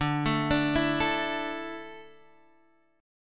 D Suspended 2nd D down Guitar Half Step Down, chord